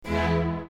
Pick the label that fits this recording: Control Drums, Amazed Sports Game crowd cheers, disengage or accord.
accord